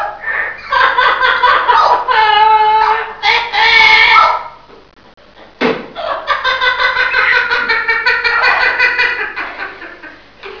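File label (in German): lachen, Papageien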